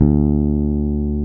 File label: Basses, Roland